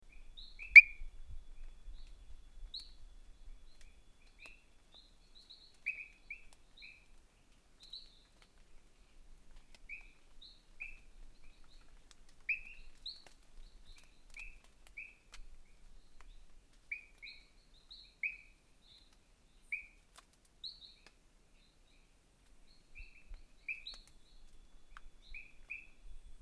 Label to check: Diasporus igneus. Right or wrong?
Right